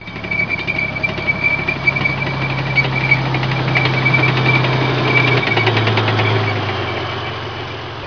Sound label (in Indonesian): suara mobil